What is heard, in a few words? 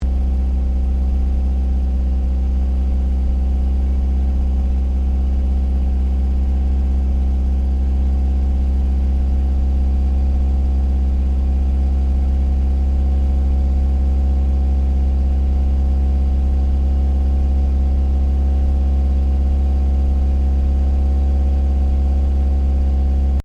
Car Ford Focus Idle Medium Exhaust; Ford Focus hire car; Cars (Sound Effects:Vehicles)